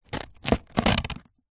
foley leaf dry fallen thick palm tropical scrape concrete; zapsplat